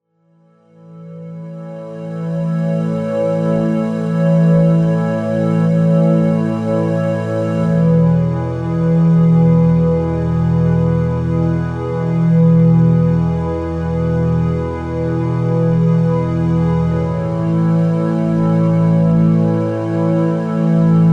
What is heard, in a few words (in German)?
Entspannungsmusik für Babys